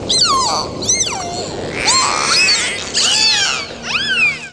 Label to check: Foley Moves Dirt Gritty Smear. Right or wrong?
Wrong